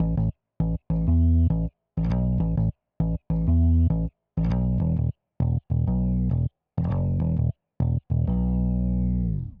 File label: bass